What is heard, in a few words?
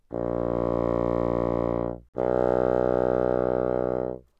Bassoon mf Bb1 B1